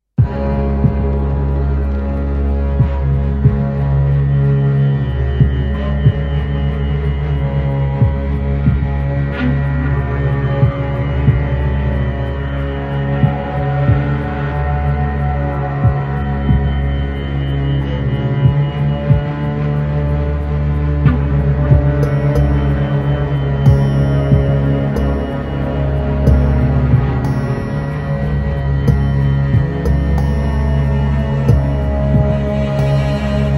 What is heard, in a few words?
FOREST CALL